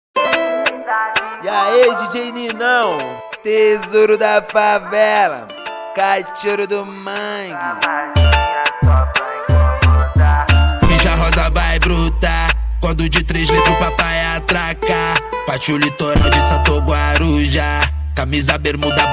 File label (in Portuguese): som automotivo